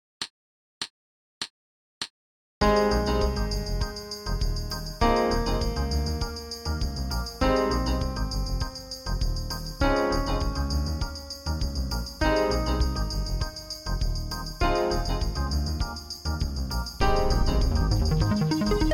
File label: A-flat Concert Whole Notes